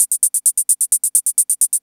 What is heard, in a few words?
hihat 2, 130, drum loops